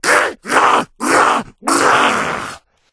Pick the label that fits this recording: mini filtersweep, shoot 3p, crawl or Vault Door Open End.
crawl